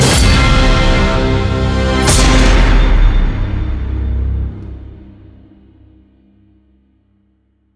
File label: big Win End